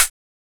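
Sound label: hi-hat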